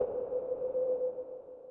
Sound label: beat; garrysmod